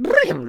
Firework Archer Attack Vocals

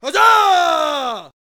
Super Boxer Attack Vocals

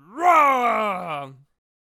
Bomb on a Stick Attack Vocals

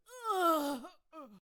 Exiled Sentinel Death Vocals

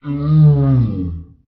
Ice Giant Death Vocals

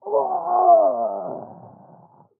Executioner Death Vocals

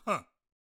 Teacher Attack Vocals

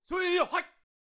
Taekwondo Attack Vocals

